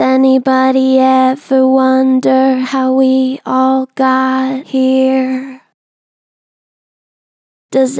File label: Gen Vib Anybody ever wonder, Vocals, Natural Vibrato Gentle; Harmor Vocals